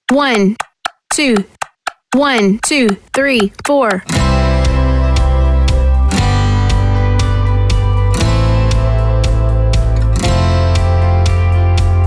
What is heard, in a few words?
Ukulele